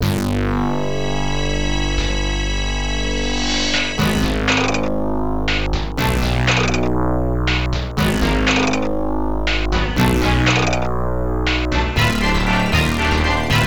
Continue; Rohga Armor Force; Wolf Fang; arcade; Death Clow

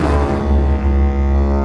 Mus Tsunami 145 Light; From Dust; ps3; xbox 360

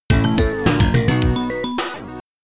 Snowy Peaks Jingle; Whizz; Amiga

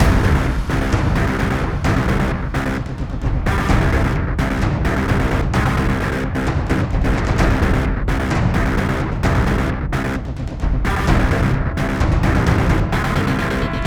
Brutal Hybrid Battle Game Loop 130 Bpm Cm; Strike Team Gladius; Machines Of Destruction

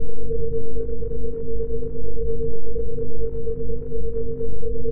Ambience Scifi Static Tonal Loop

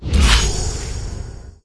Pickups

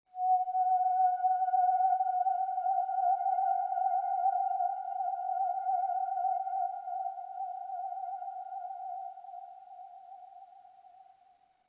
Oneshot Synth; Zombie Army 4 Dead War

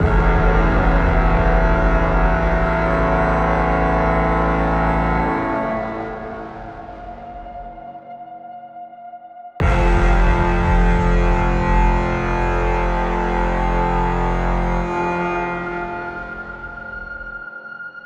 Prismarine Colossus A Horns; Hero in Residence

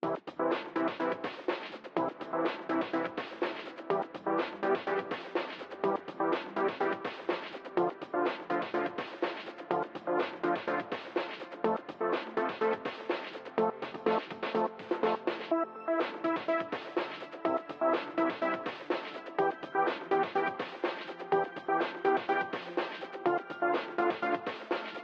radio; Superstatic; radio leveler

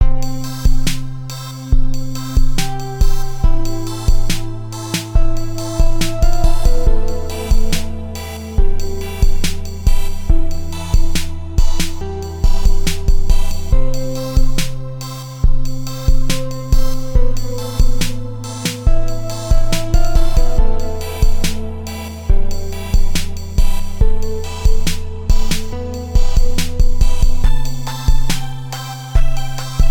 Electrobit; Cally's Caves 4

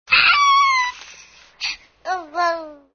crying; baby; Little Bill

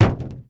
Impact

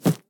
flap, Flying Insects

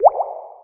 Drip echo; ps1; gamerip; Buggy

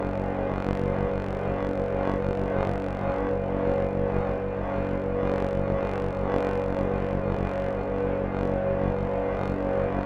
AMB SCI-FI LOOP; gamerip; strike team gladius; ps4 ps5 switch windows xbox one

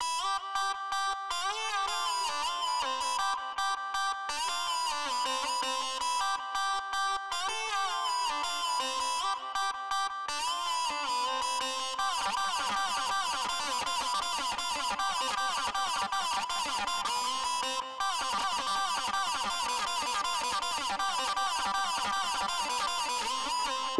Music Body Synth; GnomeGnight

